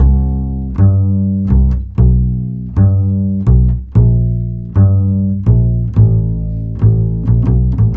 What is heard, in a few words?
Cool Upright Bass